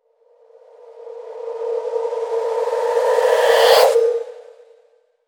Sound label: Rise